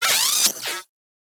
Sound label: Gas City Robot Cleaner Death; Ambience; Props; Warframe; Corpus Gas City; Props Remaster